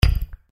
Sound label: click; クリック05 クリック。